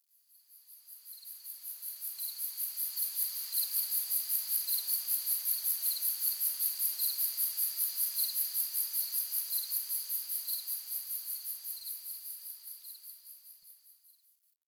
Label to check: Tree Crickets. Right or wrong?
Right